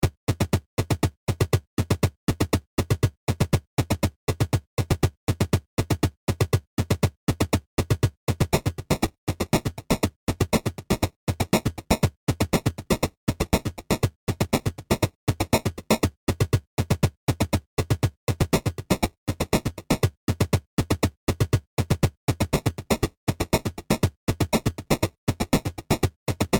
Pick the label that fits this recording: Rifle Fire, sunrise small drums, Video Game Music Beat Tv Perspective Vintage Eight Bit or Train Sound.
Video Game Music Beat Tv Perspective Vintage Eight Bit